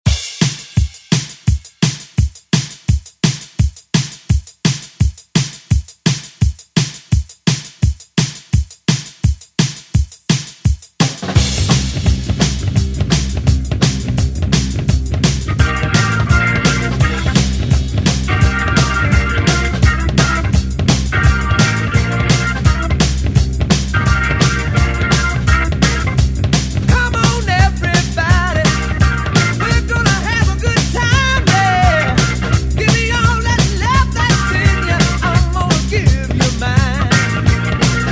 70s Re Drum; DJ Mhark

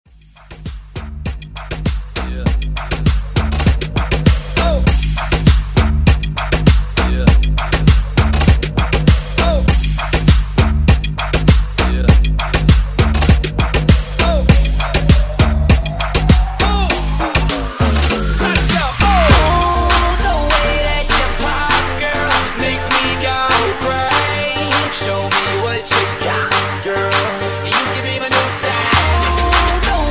Moombahton Redrum

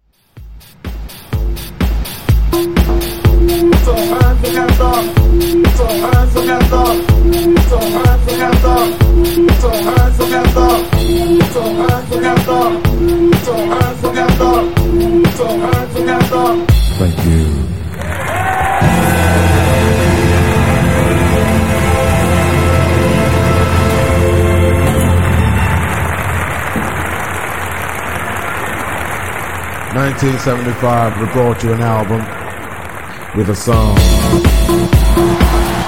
Funk 125Bpm

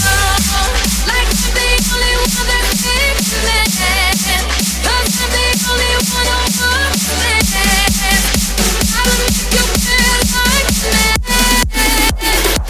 Bounce 128bpm

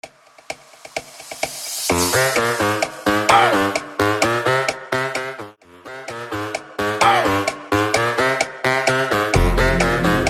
Tech House 129 BPM

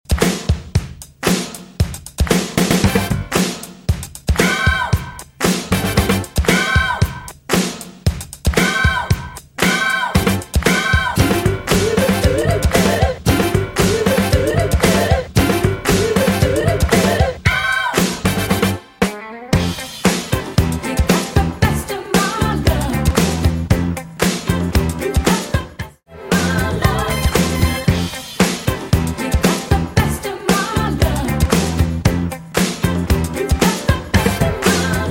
Mashup Re Drum

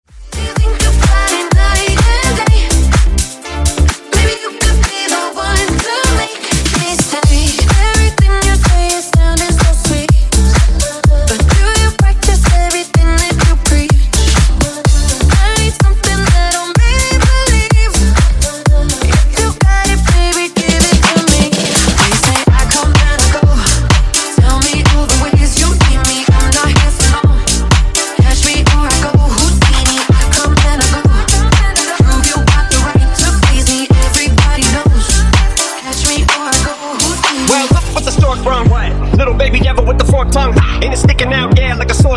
126 Bpm

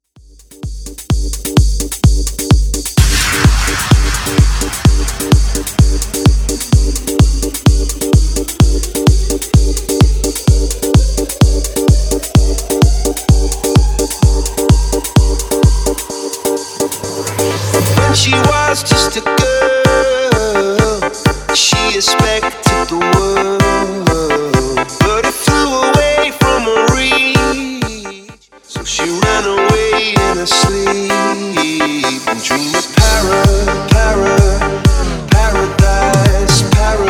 Party On Remix 128bpm

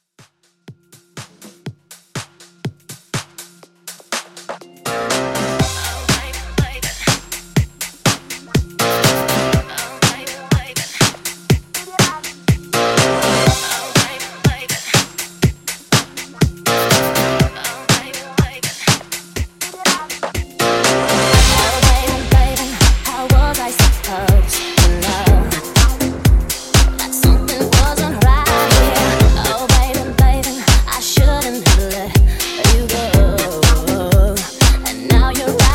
90s Pop Club Mix 122 BPM

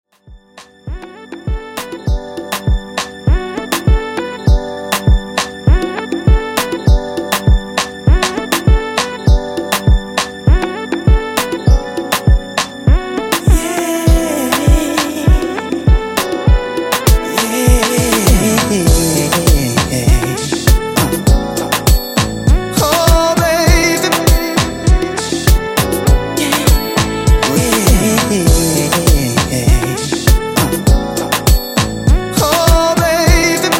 90s Afro Redrum 100Bpm

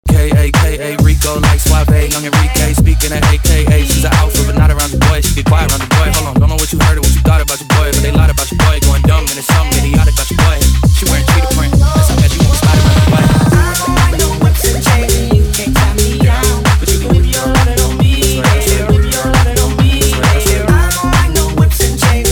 Club Party Banger

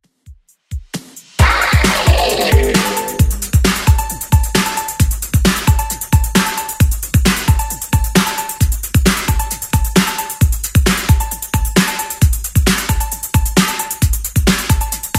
80s DJ Intro Outro Redrum 115bpm

